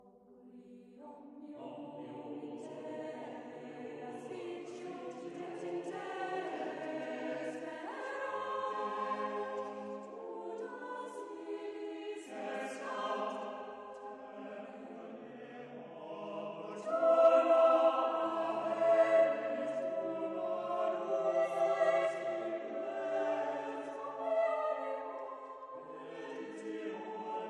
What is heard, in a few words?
Grace SATB extract